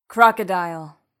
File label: crocodile; bestiary; 5etools